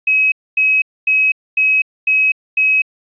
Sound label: Rear Brake Pressure